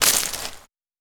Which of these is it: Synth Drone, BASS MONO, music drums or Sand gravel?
Sand gravel